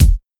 rich top end kick sound e key, kick tuned to the E note; kick drum samples